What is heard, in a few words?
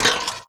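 HH, hi-hat, drum kit; Sonic Foundry (Sony Creative Software); Esoterik Beatz; Percy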